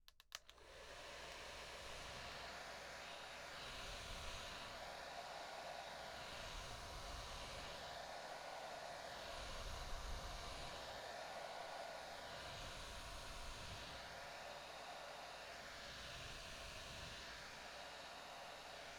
ドライヤー

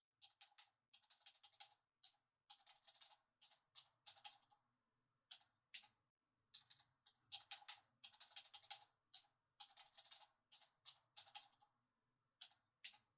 ベッドの上で耳舐め手コキ（ASMRver）

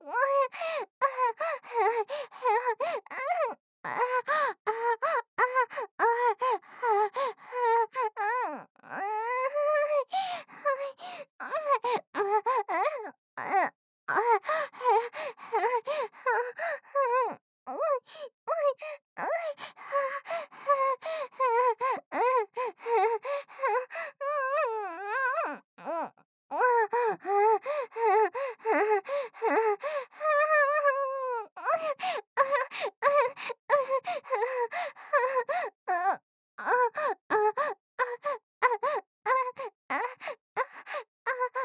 本番喘ぎ; おまけループ（オナサポ）; 喘ぎ声; SE無

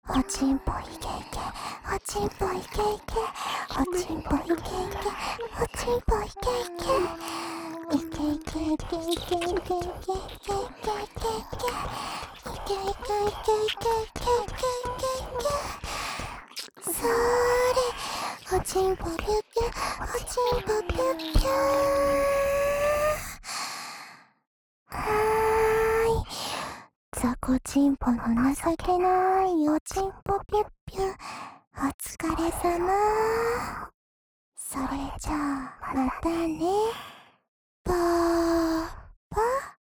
射精しちゃえ♪＋Wディープキス; おまけループ（オナサポ）; パパのざぁこ♪ざぁこ♪

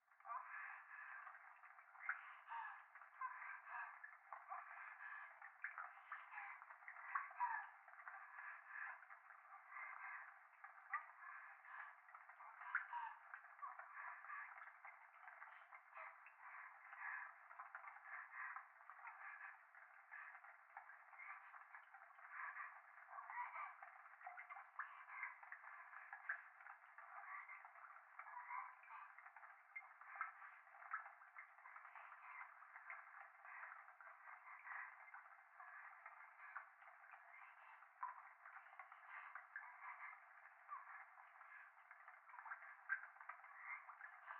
風呂 右耳密着処女喘ぎ 雨上がり

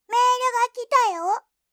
新着メールの通知

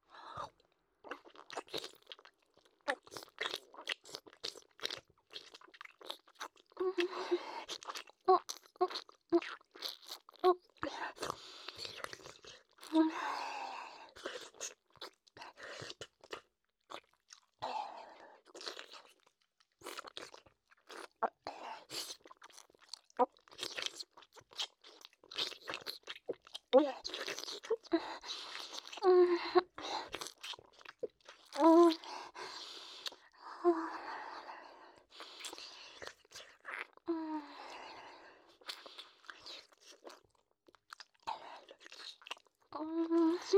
フェラチオ; SEあり

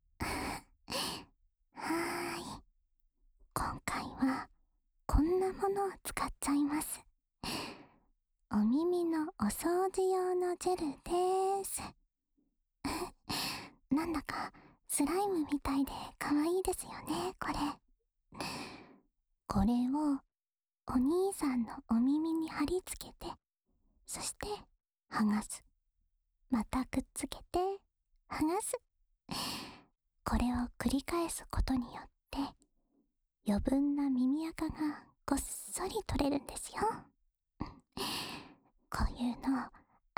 お掃除スライム（右耳）, ASMR

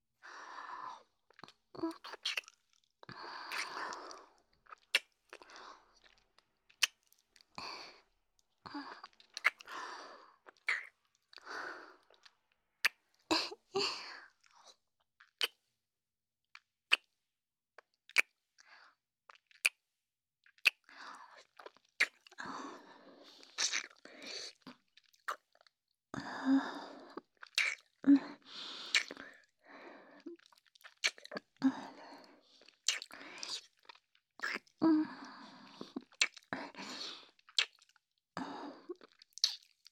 キス; SEなし

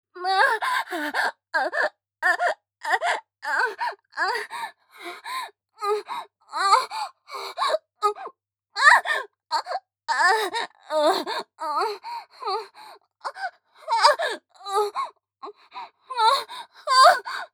正常位 喘ぎ 通常; ヘレナ

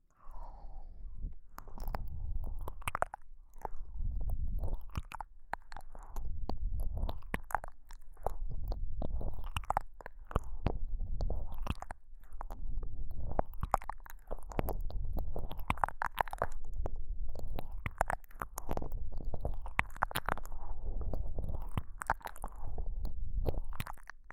KU100 右耳 抜き差し

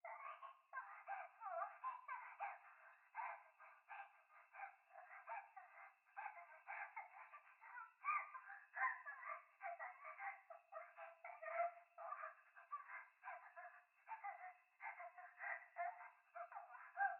風呂 可愛い喘ぎ 山奥夜

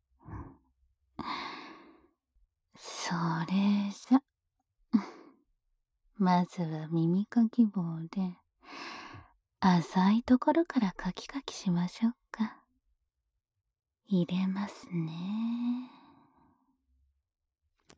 【左】浅め耳かき

